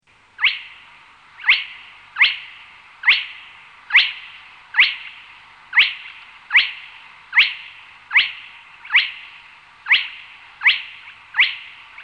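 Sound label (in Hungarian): Pettyes vízicsibe, Madarak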